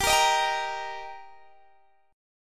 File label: G Minor Sharp 5th G, Guitar, DADGAD; chordshape; down